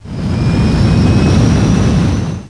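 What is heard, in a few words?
extra ball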